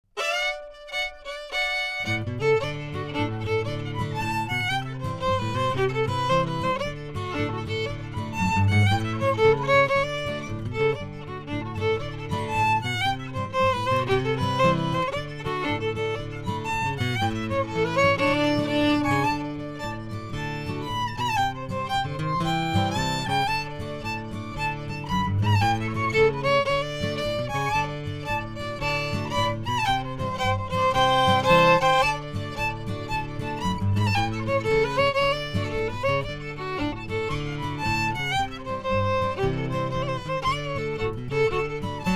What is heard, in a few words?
Jig